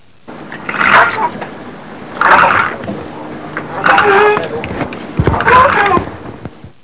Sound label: noise